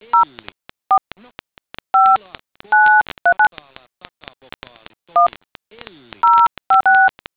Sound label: dtmf